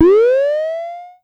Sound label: Amdek Perc